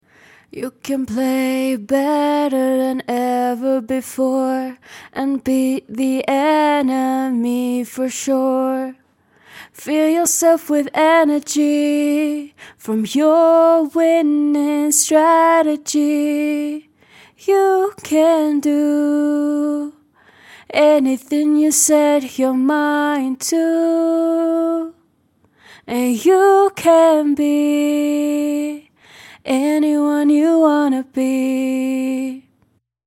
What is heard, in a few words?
acapella, Pop 110 CM